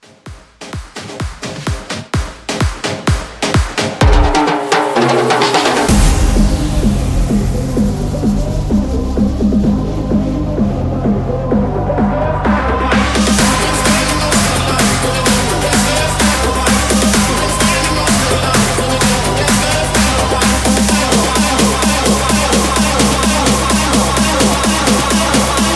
Tribal Bootleg

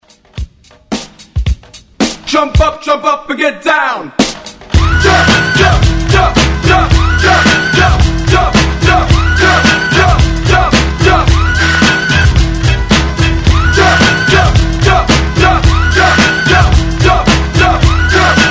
transition 110-128

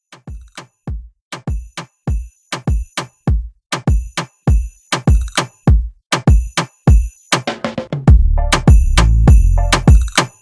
Moomba Club

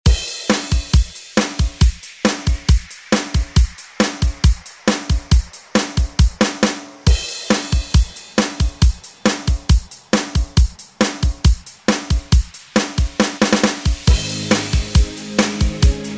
80s Re Drum

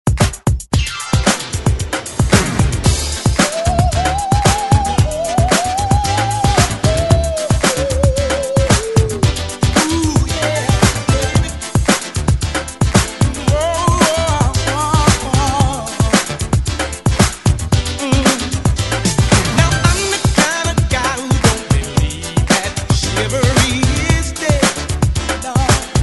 ReDrum 113 Bpm

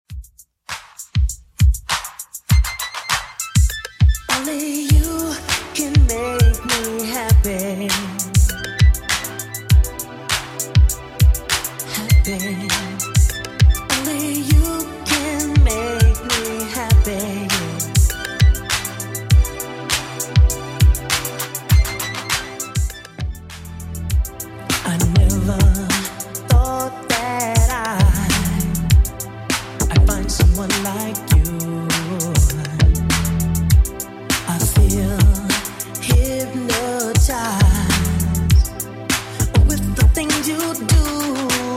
80s Redrum 100bpm

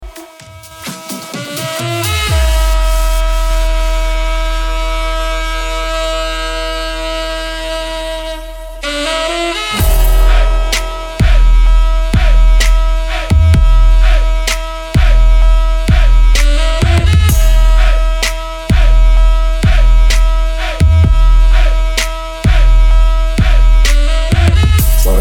EDM Festival Smasher 128bpm

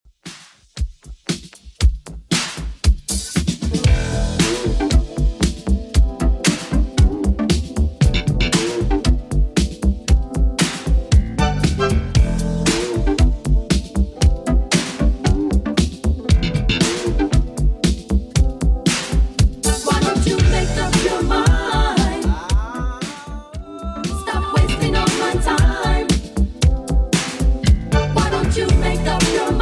80s Redrum 116bpm

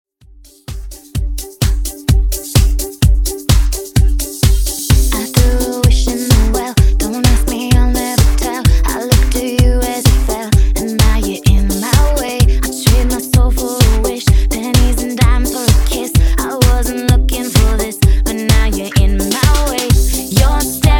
Mashup Blend

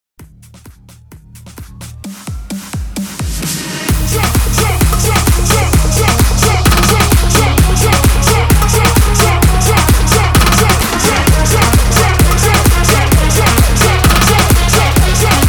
130 BPM

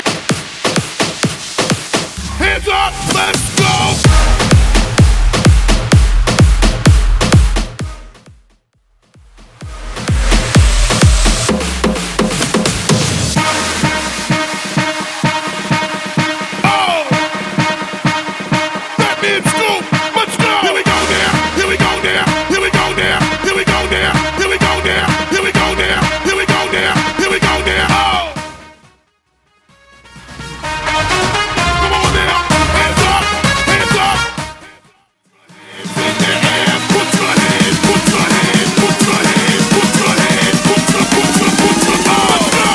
Bootleg Festival Mix 128 Bpm

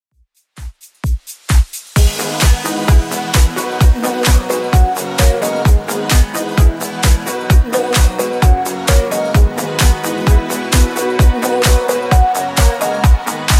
90s, 130bpm

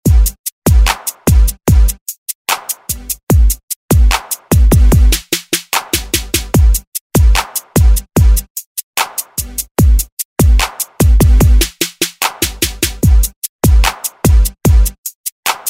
Kpop Redrum

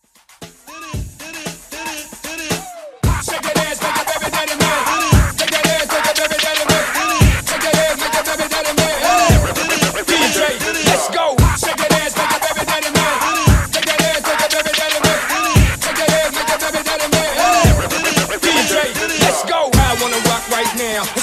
Scratch Breakz 115 BPM

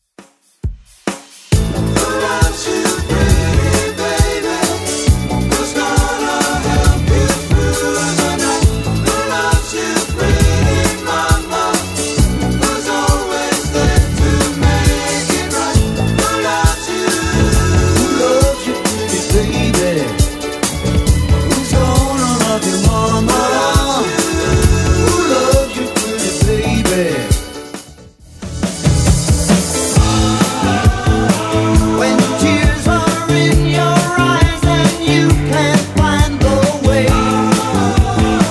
70s Redrum 135bpm

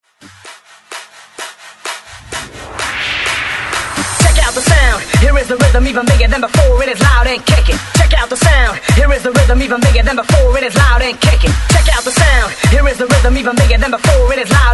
Remix Hype 128 Bpm

